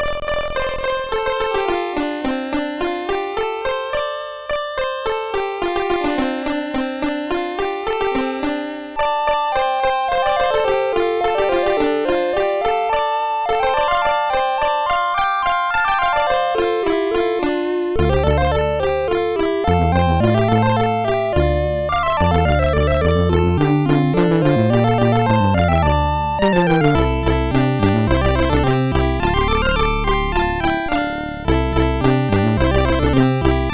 disk version MOS6581R4; sid

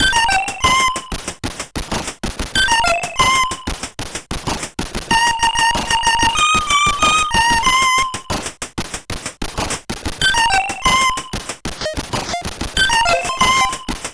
sid CSG8580; Hit the Road

